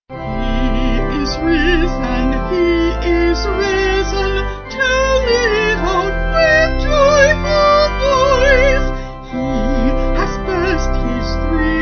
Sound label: Vocal Organ